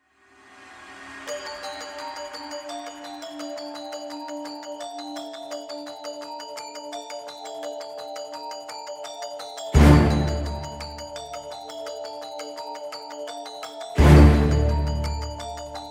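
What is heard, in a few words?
with small percussion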